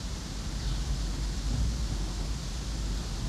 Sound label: Ambience Loop, outdoor